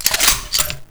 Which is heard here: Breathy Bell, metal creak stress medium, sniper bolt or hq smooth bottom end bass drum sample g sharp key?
sniper bolt